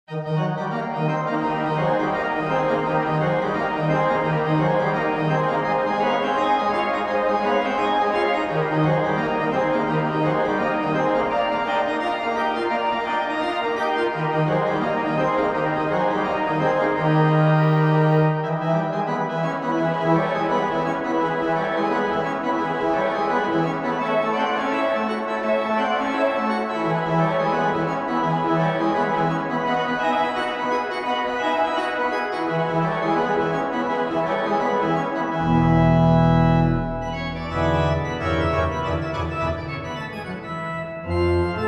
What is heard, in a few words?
Organteq amusement, Boogie